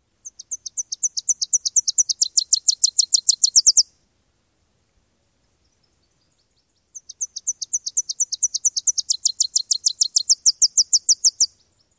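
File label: TENWAR songs num 2, Tennessee warbler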